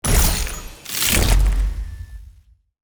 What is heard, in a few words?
result score